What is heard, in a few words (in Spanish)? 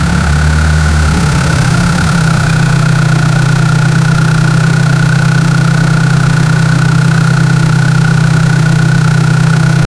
encendido de motor de un; sportscar